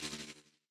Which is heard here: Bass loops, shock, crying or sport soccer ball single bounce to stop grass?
shock